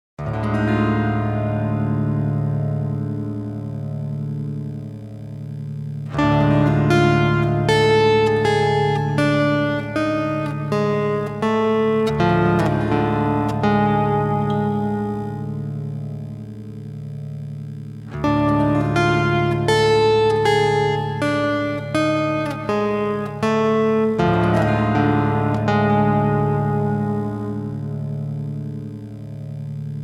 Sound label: Symphony in Raga Suvarnangi